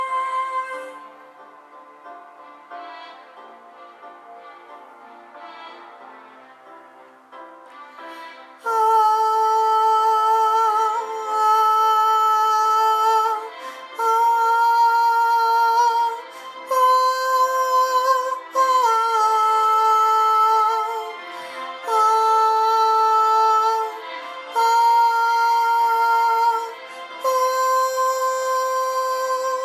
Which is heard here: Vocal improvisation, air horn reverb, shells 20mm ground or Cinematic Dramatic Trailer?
Vocal improvisation